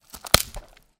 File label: Breaking Stick